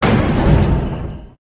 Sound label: structure blunt; Barotrauma; Subsurface; Damage